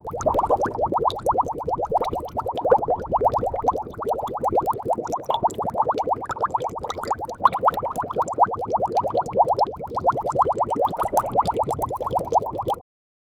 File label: the sound of bubbles fall